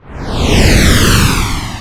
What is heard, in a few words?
Ship Fly by short